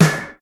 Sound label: METAL SN; BEAT2000